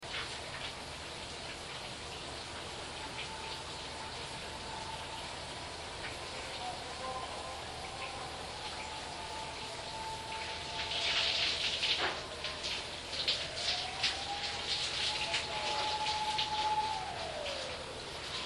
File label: bath siren